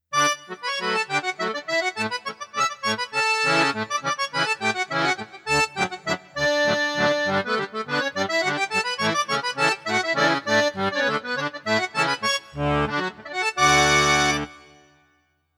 accordion italy; Casio PX-S3100

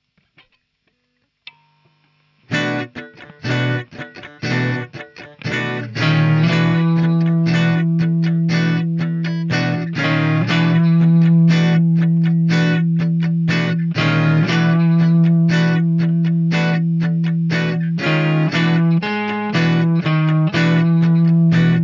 Amp Room Vintage Clean; Softube Amp Room Bundle

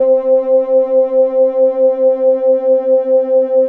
clavinet; synplant

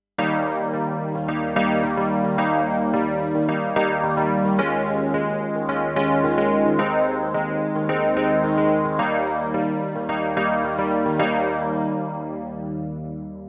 kurzweil artis wurlitzer

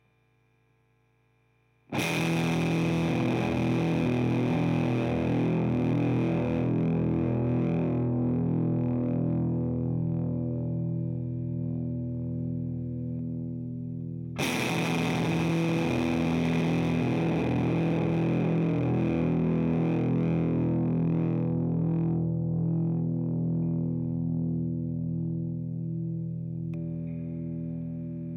more meat; kma fuzzly bear

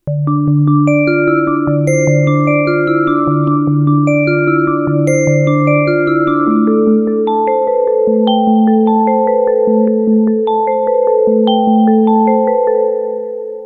Novation Peak test bell arp

